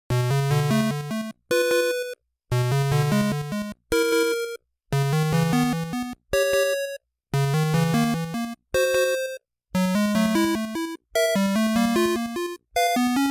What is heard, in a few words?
Cave; legend of zelda the link's awakening